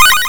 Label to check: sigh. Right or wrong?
Wrong